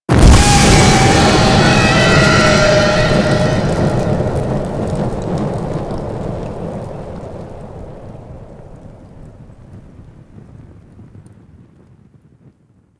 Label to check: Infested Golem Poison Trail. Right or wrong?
Right